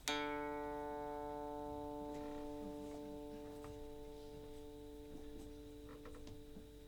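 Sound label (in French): Electrique re, guitare électrique stratocaster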